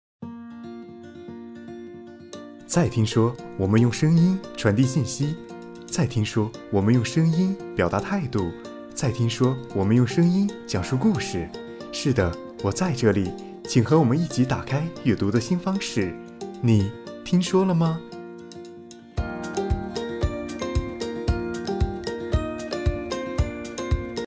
音乐缩混

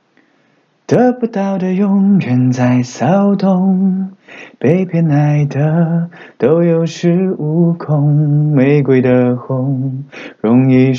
清唱

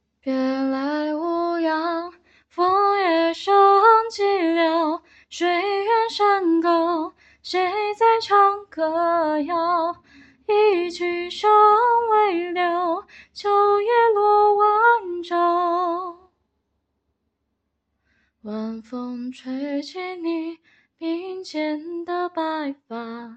两首歌曲串烧

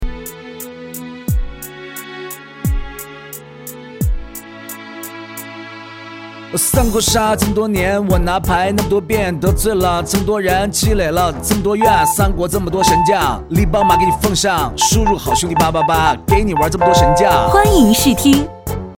说唱; 三国游戏

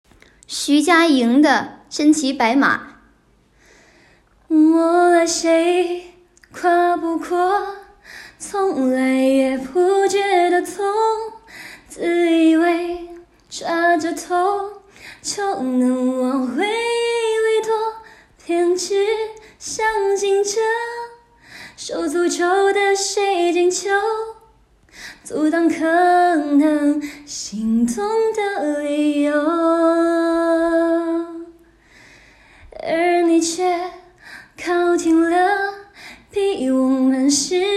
歌曲

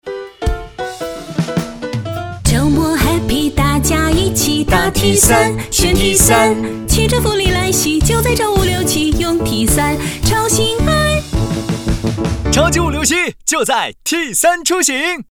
品牌广告歌

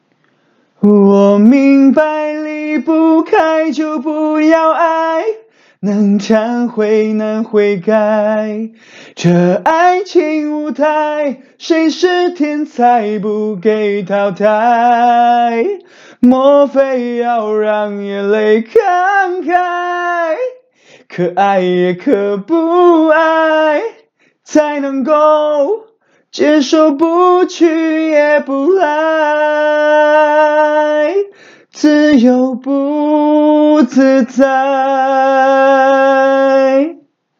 清唱

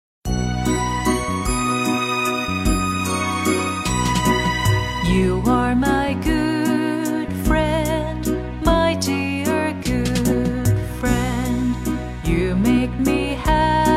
英儿歌成女音色

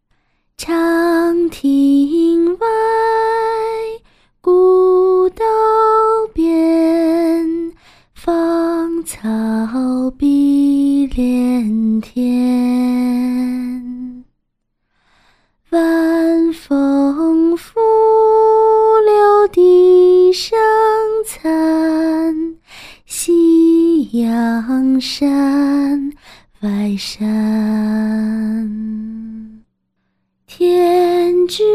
送别歌曲清唱

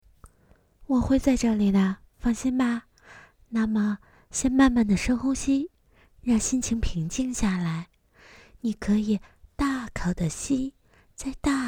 深呼吸

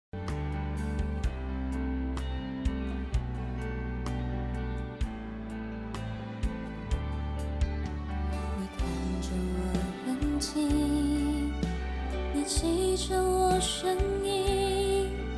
歌曲

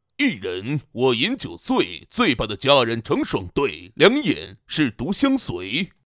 喊麦

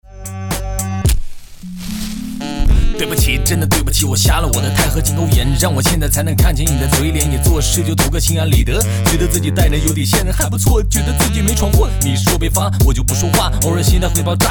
原创词曲风格说唱

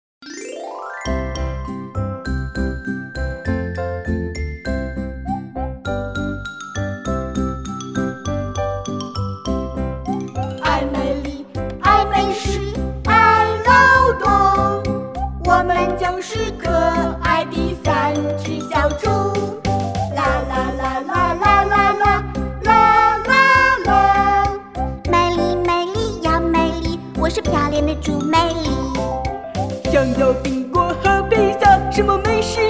儿歌三只小猪

